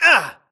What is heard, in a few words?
grunt hits; Titanfall 2